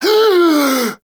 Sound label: Friendly Revive Complete Gasp; Friendly Grineer; Warframe; Veilbreaker; Kahl